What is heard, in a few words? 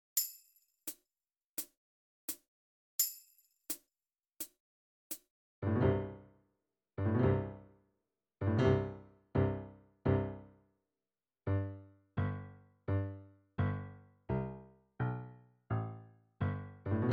Big Bass Drums